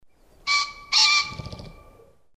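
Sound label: green pheasant; kiji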